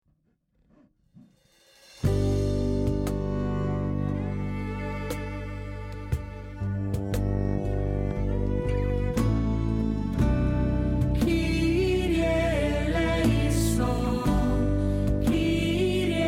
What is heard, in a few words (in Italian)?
kirye eleison piano